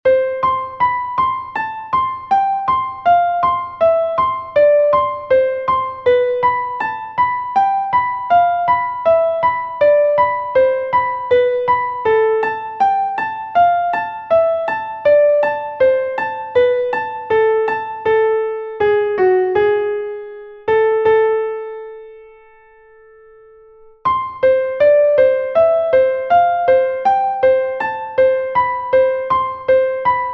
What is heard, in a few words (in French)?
PASSACAILLE